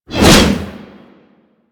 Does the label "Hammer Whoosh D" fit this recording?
Yes